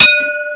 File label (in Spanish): campana